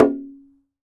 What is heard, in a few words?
Bebarongan, Percussion; Roland BK-5